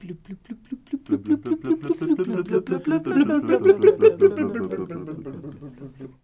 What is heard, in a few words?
Fish Sounds